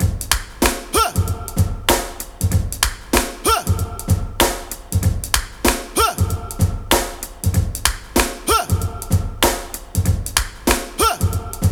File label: 95.5 Huh, breaks; Lockdown Breaks